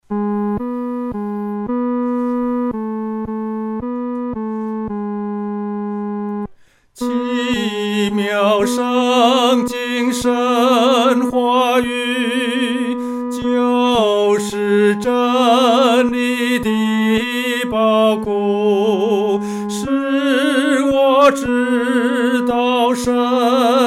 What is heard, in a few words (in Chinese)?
独唱 第三声; 奇妙圣经